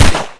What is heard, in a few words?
fire; briefnegotiator